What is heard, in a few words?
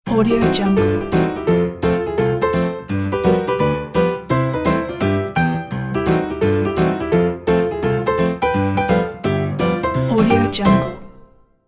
fancy piano logo